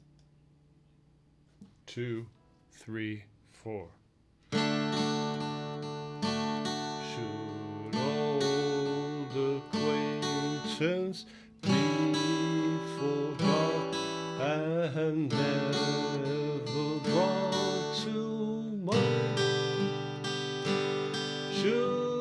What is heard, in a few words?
Aul Lang Sine D-A7-D-G D-A7 Bm-A7